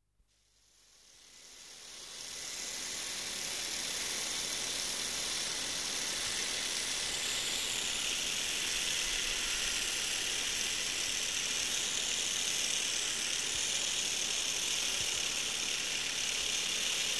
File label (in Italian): OFFICINA FABBRICA